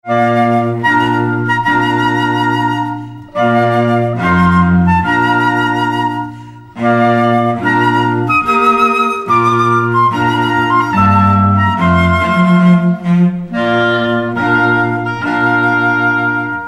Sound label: Classical Trio